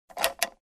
Light button push and snapback

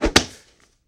Leg Kick Grab

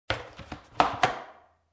Garbage can lid

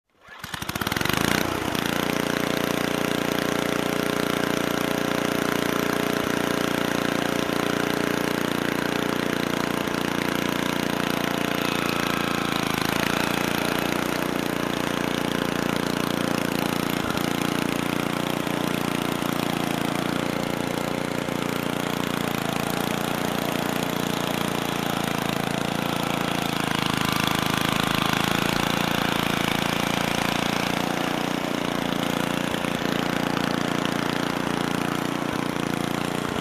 Hedge trimmer sound

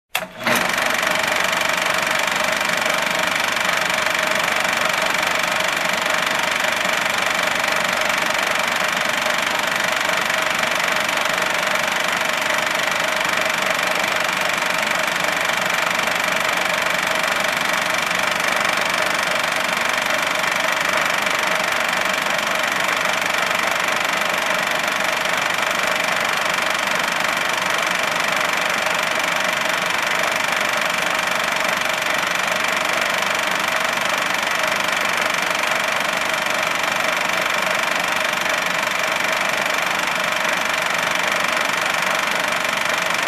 Cinema Camera Projector Running

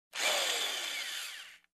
Electric Hand Drill Single Rev

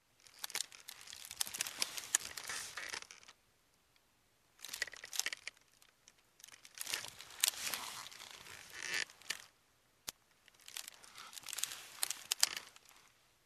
Creaking Furniture

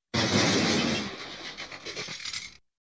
Explosion with Scattered Debris Sound

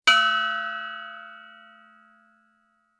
Clong sound

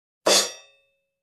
Muted drum crash

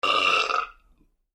Long Burp

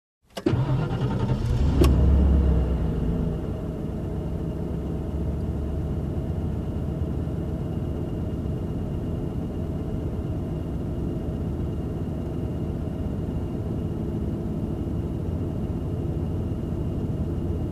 Car engine ignition and running idle from inside

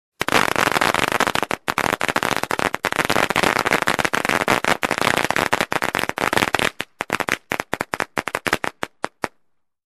Firecrackers

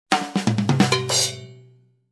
Drum fill with toms and short crash

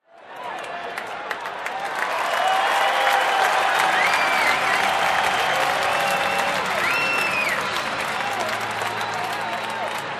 Pre-performance Crowd Cheering

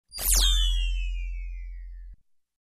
Laser Drop

